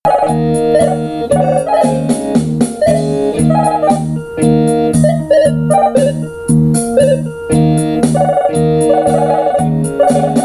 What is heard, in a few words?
telephone rock, msx